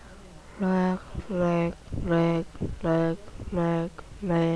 laugh

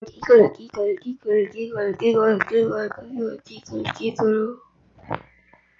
giggle

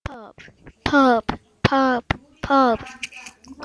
pub

